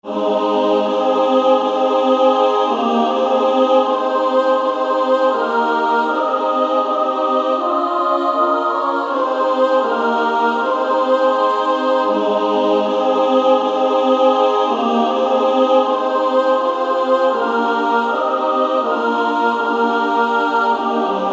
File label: Female Choir